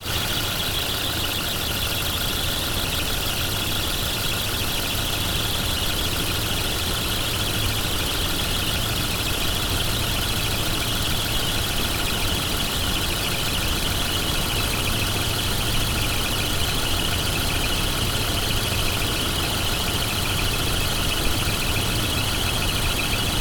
car idling

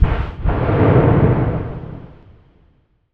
far explosion

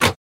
raise a pinball flipper

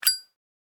opening zippo lighter lid

